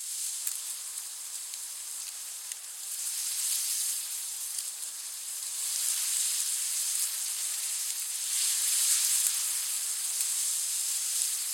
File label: Grass Wind; DeadFright